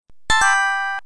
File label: Popup